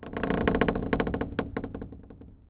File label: wood creak; Ambient; MX vs ATV Unleashed